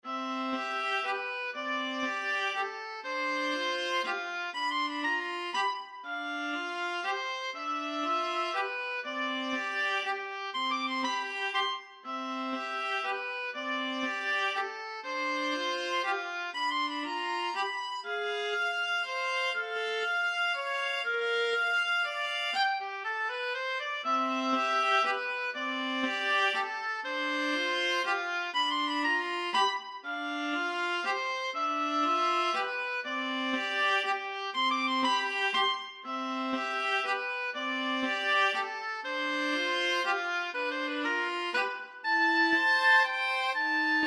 oboe viola, oboe-viola duet

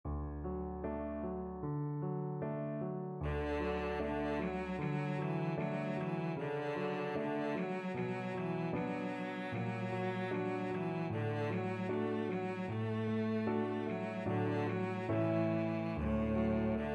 a la claire fontaine, cello

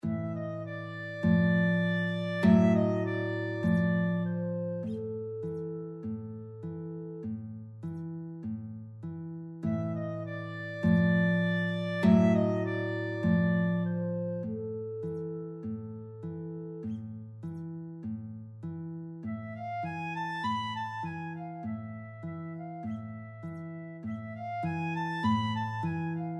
clarinet guitar, guitar clarinet duet

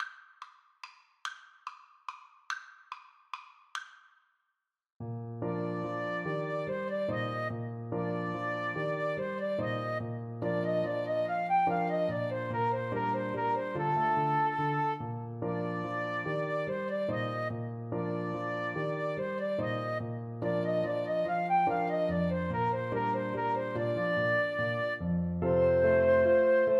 Flute Clarinet Duet

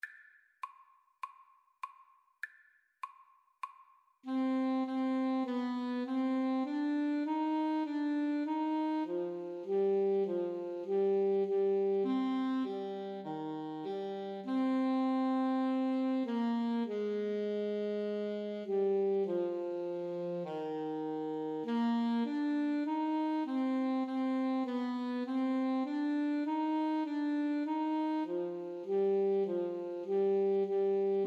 Alto Saxophone Duet

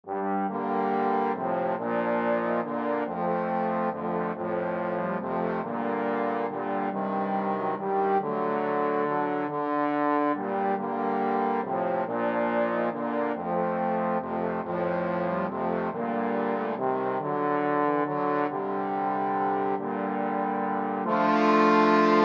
trombone quartet

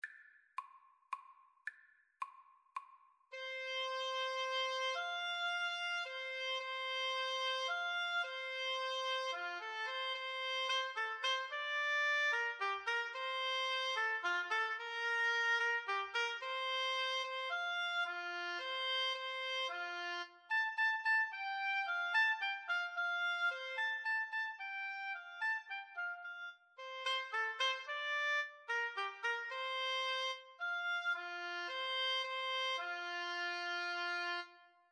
water music flute oboe, Flute-Oboe Duet

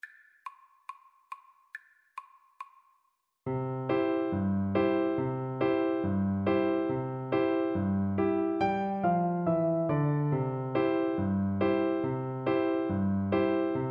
alabama, Alto Recorder

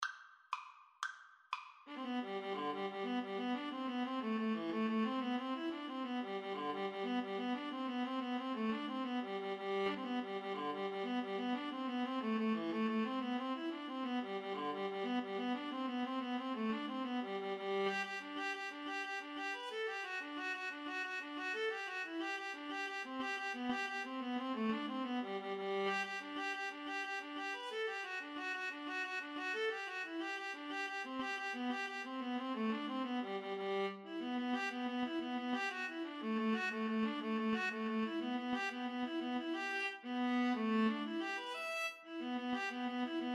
irish jig medley, Viola Cello Duet

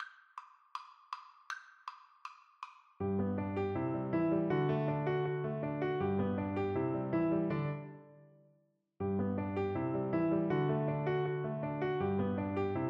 piano duet

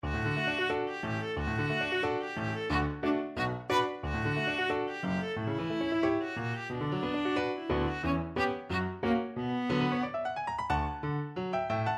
violin concerto 3rd, viola

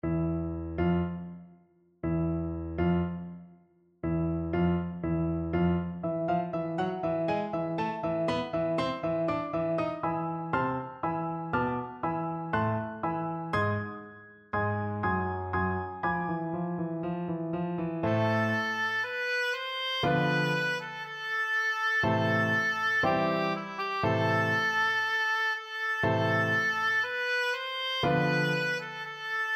dvorak, oboe

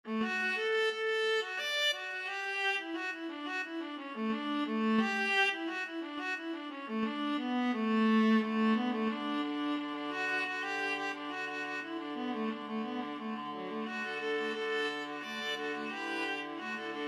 viola ensemble; cotton eyed joe

